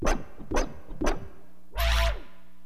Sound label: STABS, HipHop; LiveAct Pool